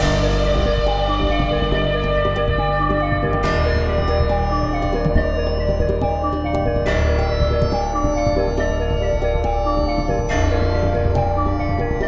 Gamelan techno Slow

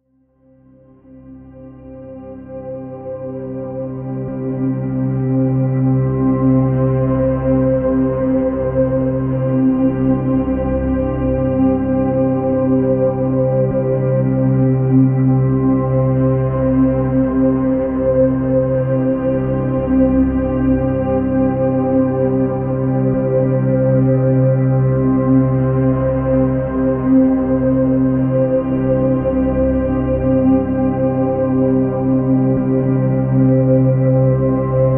Night Explore; Kingdoms

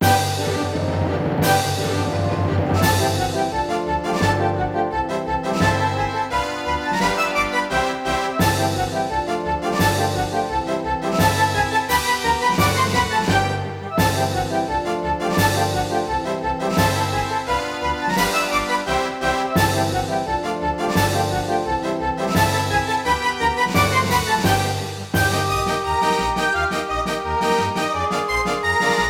BGM Mini Game; tokimeki memorial girls side 4th heart

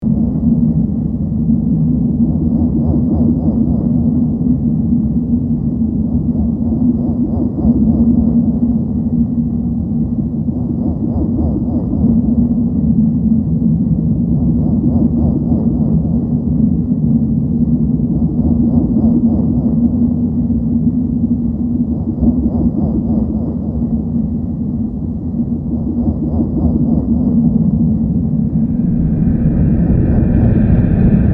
Amb Alien City

